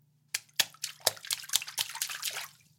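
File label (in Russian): шлепать по воде тыльной стороной ладони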